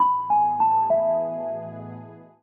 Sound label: sms; Lenovo; P700i